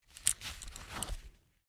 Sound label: leather belt open soft